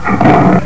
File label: grrrr; MOBICLIC 13